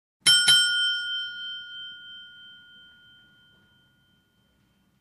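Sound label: High Pitched Desk Bell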